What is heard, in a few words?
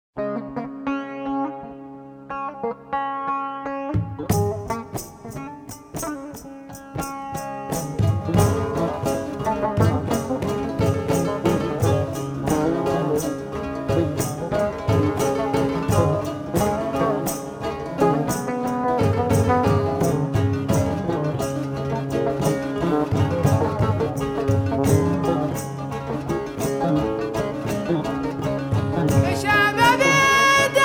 Desert Blues